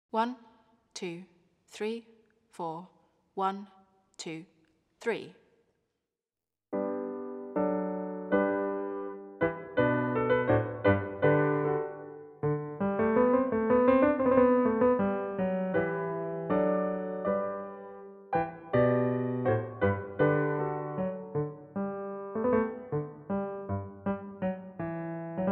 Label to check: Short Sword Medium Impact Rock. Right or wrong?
Wrong